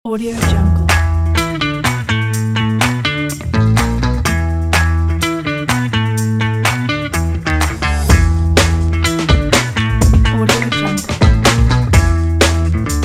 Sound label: funky in funky